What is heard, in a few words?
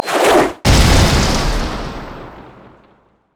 tidal wave; nami base